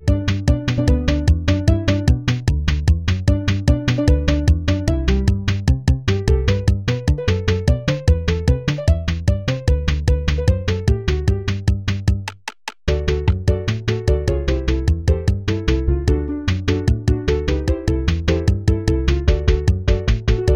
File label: noteblock